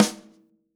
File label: Yamaha Holz L hand